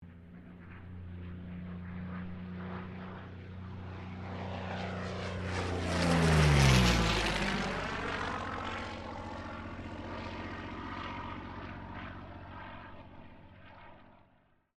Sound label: AIRCRAFT P-40 KITTYHAWK PASS STEREO